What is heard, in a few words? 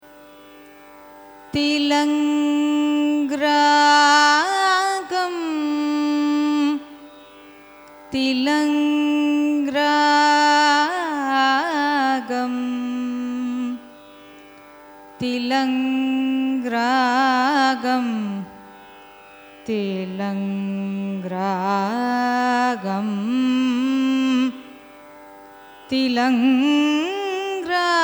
raga thilang signature, carnatic music